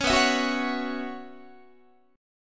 B Suspended 2nd Suspended 4th B Guitar, chord; Bsus2sus4 strummed; 16,19,19,16,17,17; 4A,4E,5A,5D,5G#,6C#; JGuitar

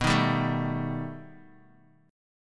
B Augmented 7th B down Bass Standard; strummed; JGuitar

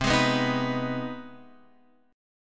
C Minor Major 7th Sharp 5th C Guitar Open F; CmM7#5 strummed; JGuitar

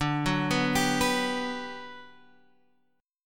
G Major 7th, D

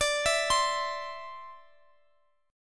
C-Major Add 9th D, guitar; Cadd9/D arpeggiated

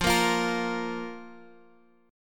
Bb 5th F

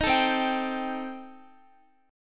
C Minor 6th Guitar, chord; Cm6 strummed; x,x,15,14,12,15

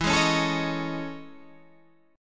A Major Sharp 11th E

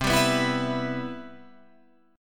C Major 7th, chord

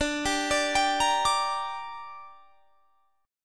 D Suspended 4th Sharp 5th; arpeggiated; 22,22,24,24,23,22

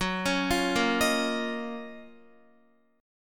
Gb Major 13th, down, Guitar; arpeggiated; JGuitar

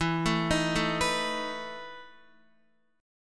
E Major 7th Suspended 4th E down Guitar; arpeggiated; JGuitar

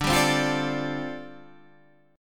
G 7th Suspended 2nd Suspended 4th D

